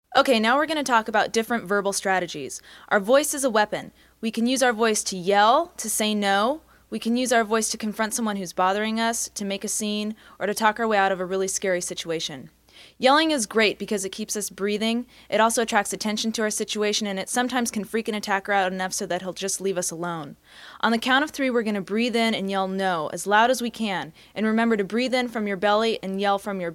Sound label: Yelling